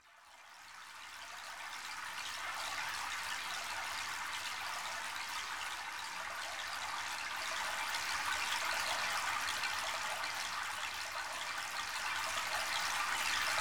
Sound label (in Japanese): seseragi; musicbox